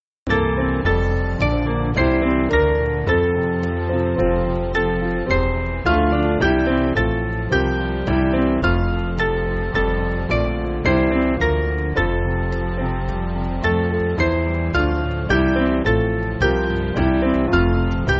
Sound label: Piano, church music; How Can I Thank